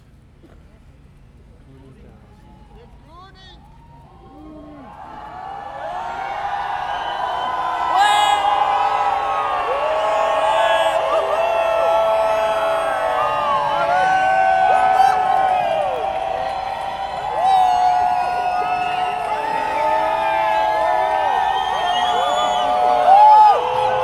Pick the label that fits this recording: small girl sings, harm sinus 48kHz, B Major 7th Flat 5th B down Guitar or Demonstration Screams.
Demonstration Screams